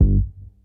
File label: IN HOUSE G1, bass; Sampleheads; Ultra Freakin' Bass; IN HOUSEBASS